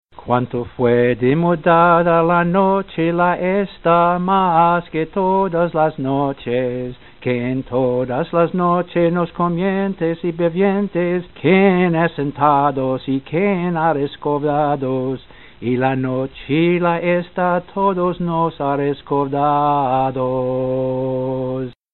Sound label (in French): manishtana ladino, chants du Seder de Pessah